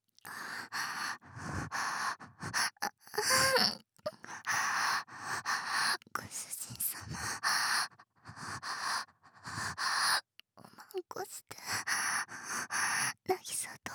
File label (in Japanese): エッチしよ 喘ぎ; DL製作班; ハーレムオナサポ 4人のメイドがご主人様のオナニーをお手伝いするバイノーラル